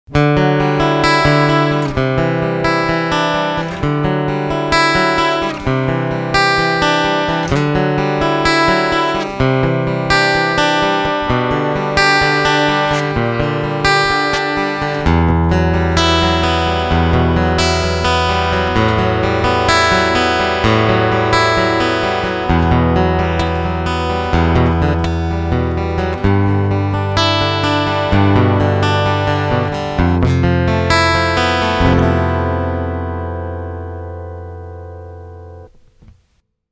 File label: Picking EQ Full